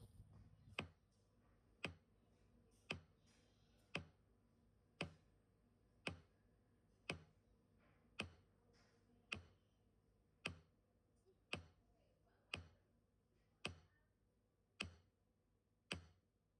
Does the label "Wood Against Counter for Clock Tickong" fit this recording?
Yes